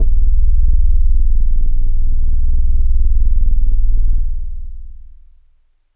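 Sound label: Leslie Kawaii D#5, organ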